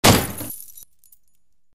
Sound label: lock break